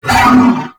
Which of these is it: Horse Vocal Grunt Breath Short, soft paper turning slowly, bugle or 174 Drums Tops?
Horse Vocal Grunt Breath Short